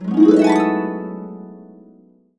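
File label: E major, Harps; USB Soundscan vol.62; AKAI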